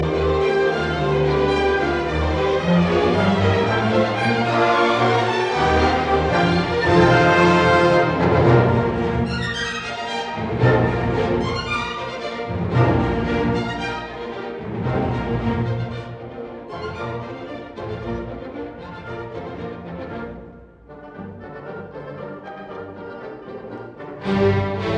Symphony in B flat major Spring Allegro animato e grazioso; Schumann; Op 38